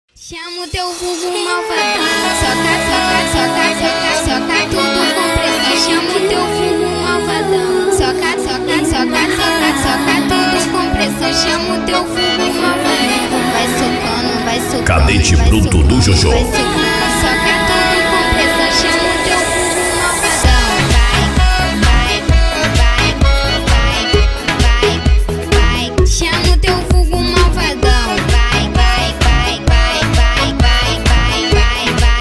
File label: electro funk